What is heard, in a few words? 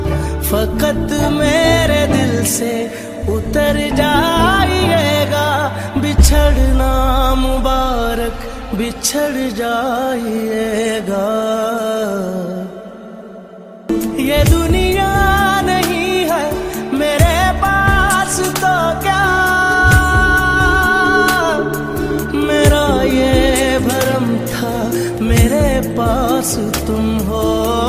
Heart Touching Song Ringtone